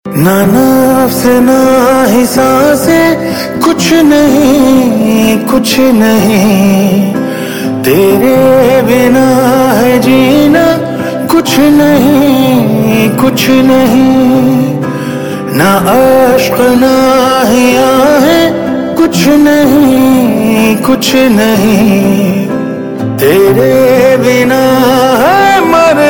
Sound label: Romantic Bollywood Movie Ringtone; Tubelight